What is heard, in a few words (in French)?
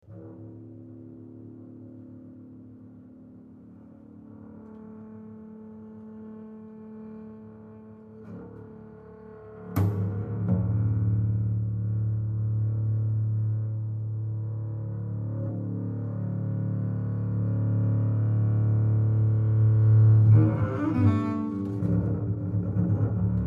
Contrebasse solo; Cycle des siecles